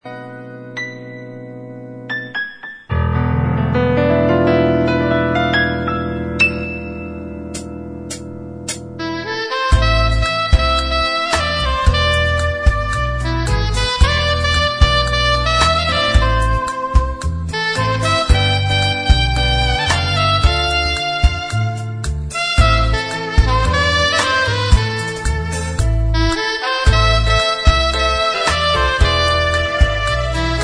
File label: Tango, Saxophone